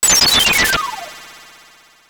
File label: star gun; geno; SNES; SMRPG